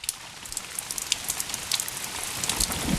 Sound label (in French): pluie